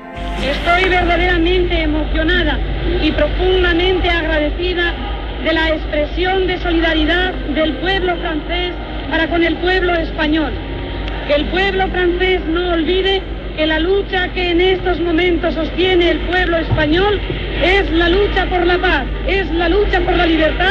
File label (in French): Chant révolutionnaire espagnol